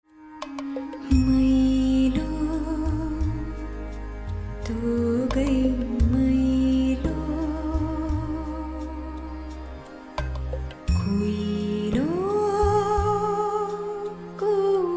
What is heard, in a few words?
Lullaby